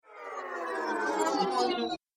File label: Laser, sci-fi; On The Laser Trail